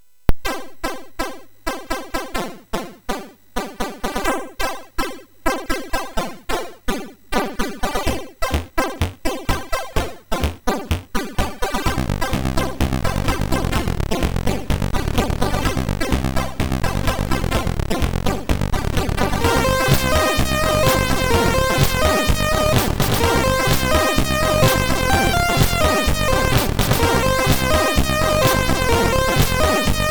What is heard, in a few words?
Short n funky, MOS6581R3